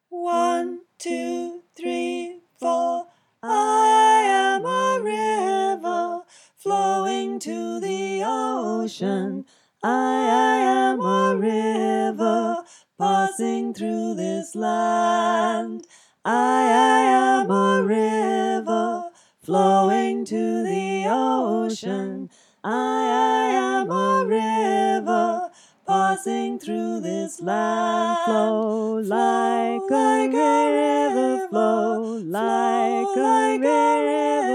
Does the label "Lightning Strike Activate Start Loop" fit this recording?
No